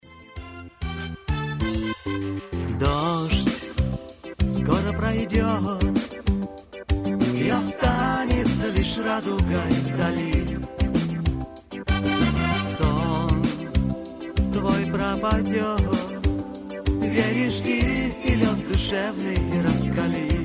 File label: rain pop reggae